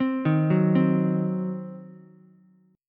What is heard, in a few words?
Eb Minor 6th Ukulele, Ebm6 chord; arpeggiated